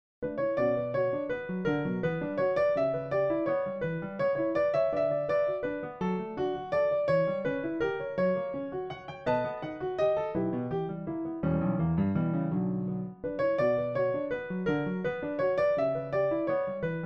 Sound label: Piano Lied; la express Brahms piano lied (1:07